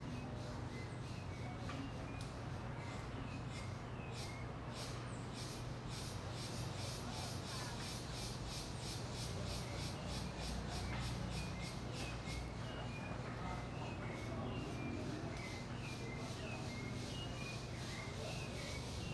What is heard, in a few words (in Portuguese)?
Ambiencia residencial a tarde muitas cigarras, pessoas dentro de casa